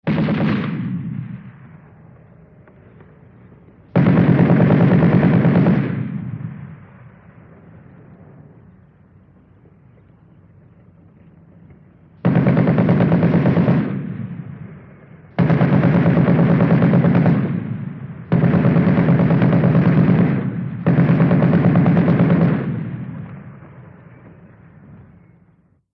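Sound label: Machine Gun Rapid Fire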